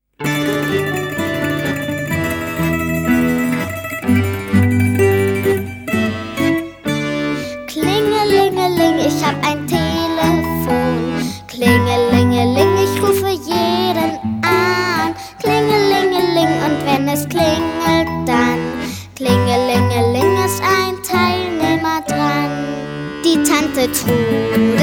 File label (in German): Telefonlied